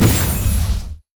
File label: lux lightbinding; LoL